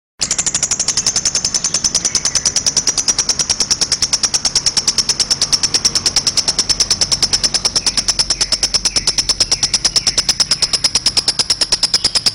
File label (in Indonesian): lovebird salju